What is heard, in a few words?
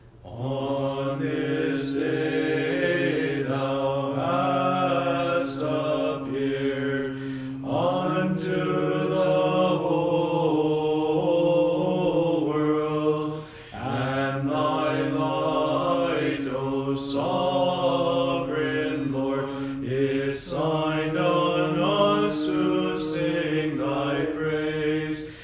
Kontakion Theophan Choir English Language Orthodox Church; St. Nicholas Choir; Toronto Orthodox Service